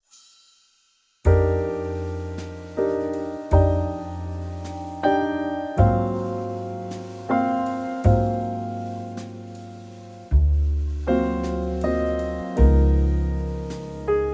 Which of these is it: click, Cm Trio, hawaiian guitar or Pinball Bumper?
Cm Trio